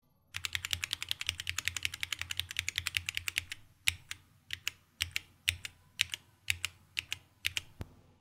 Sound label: Gateron Switches Sound Test Red Blue Brown Yellow White Black Green